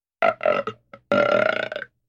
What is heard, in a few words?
Burping